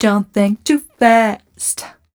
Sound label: f mel e flat, fem Vocals